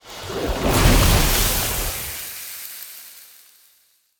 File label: Monster; Zenless Zone Zero